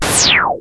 NOISE SHOT; Best Service Alpha Dance vol.2

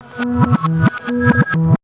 REV, Big Beat Drumloops

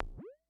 Multi, Vermona DRM1-MK2

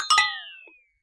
glock fx; Univers Sons - Raricussions

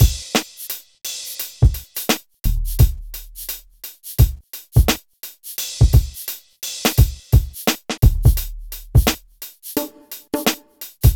Drums, Hip Hop

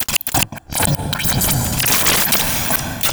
loop, techno atmos

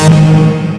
STACK06, Big Beat Samples; AKAI; STACKS KIT

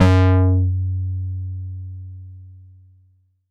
Sawtooth f#3, Korg Poly-800

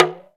TALK DRM, Heart of Africa; Spectrasonics

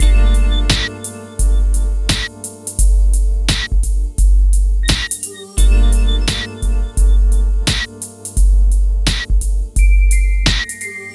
MIX, Hip Hop and R&B Drumloops

